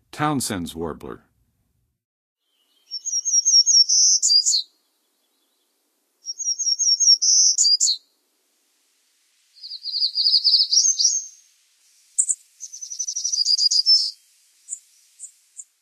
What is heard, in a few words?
Townsends Warbler, Songs of Birds of North America; Western USA; Western USA Songbirds